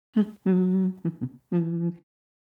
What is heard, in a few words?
Humming